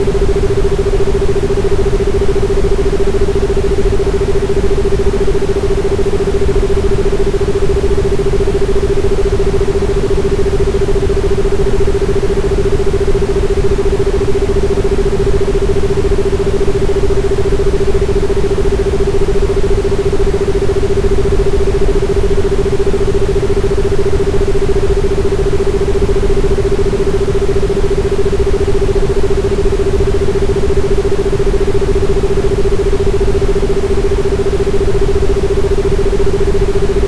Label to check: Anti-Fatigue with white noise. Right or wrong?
Right